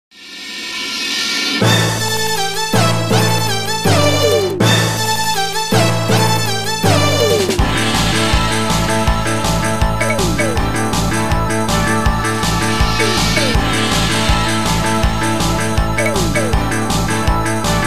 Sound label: msx